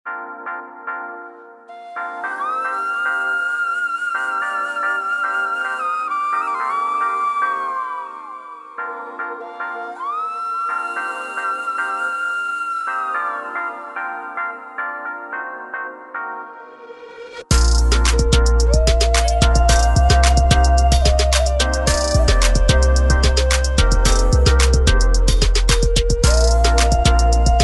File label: urban